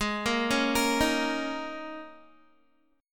Ab Suspended 2nd Suspended 4th Ab down Guitar; arpeggiated; Absus2sus4

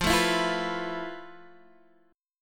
F Major 7th Flat 5th, F, down, Guitar, Standard; strummed; JGuitar

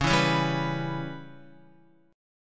Db Minor 7th Flat 5th Db down Guitar; strummed; JGuitar

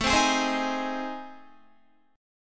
A Minor Major 7th Flat 5th, Guitar; AmM7b5; strummed; 17,15,18,17,15,15

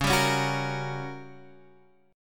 G Minor Major 7th Flat 5th Db

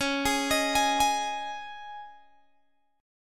Db Suspended 2nd Guitar Open G

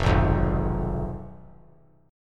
F Diminished; Fdim strummed; JGuitar

